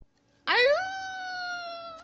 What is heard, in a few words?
ayuuu